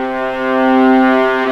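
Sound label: viola arco C3; East West; The Ultimate String Collection; VLAS ARCO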